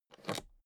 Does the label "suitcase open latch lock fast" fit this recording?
Yes